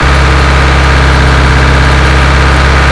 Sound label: generator diesel large; AA Dangerous Meltdown - EK Compatible